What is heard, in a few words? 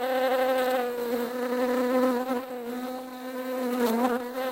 bees